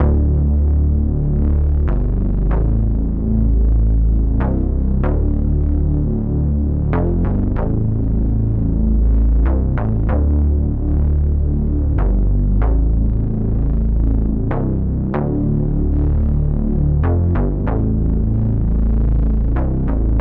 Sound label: OB-6 Bass, 95 BPM A Min; Stems; Cymatics; Dystopia; MTRON+OB6